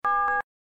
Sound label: alert; AirCoding